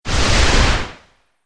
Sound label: Modulation Whoosh; Whoosh Sound Effects